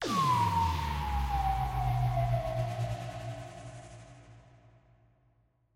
Fail; lost snowmen; android ios macos mobile switch windows xbox one

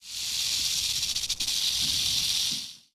Rattle Snake; children of morta

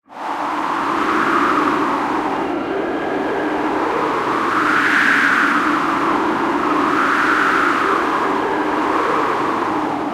wind; BGM kankyo wind

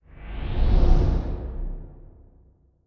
enviro wind

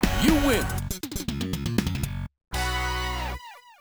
Impact! (Win)

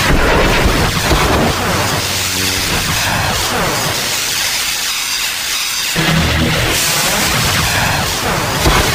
Force lightning Loop; criminality

